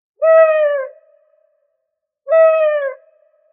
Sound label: Owl sound